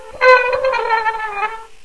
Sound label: wheelchair wheel; sven; aomdc; monsters